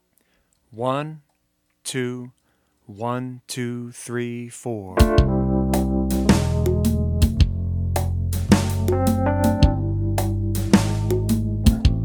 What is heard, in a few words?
Half Notes Etude